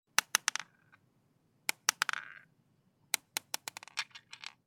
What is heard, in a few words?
marble bouncing